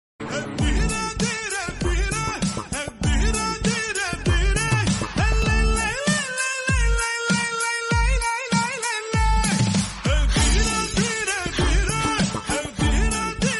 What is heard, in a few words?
yaan love bgm tamil love bgm; tamil love song